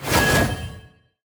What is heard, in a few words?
pandas death; generic; wukong tower